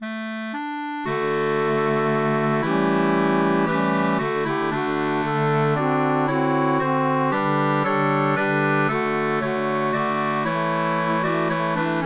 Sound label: Melody Harmony; Rose of Sharon